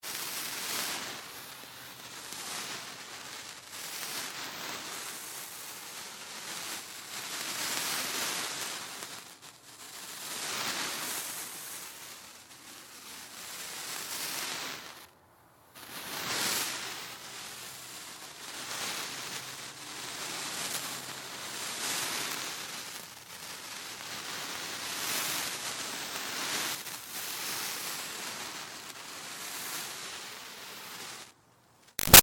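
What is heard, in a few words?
Fire Sparkler Burns Sizzle Bys Moves close-up; Fourth of July; Big Room Sound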